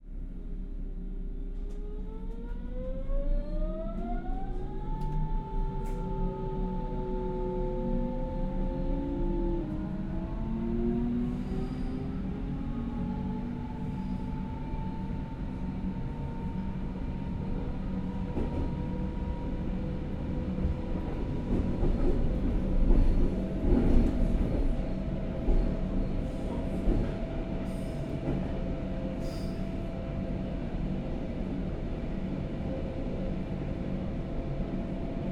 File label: Train, S-Bahn, Germany, Berlin, Ride POV, Start, Relaxed, PA, Stop